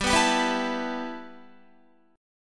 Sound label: G 6th Add 9th G Guitar, chord; G6add9 strummed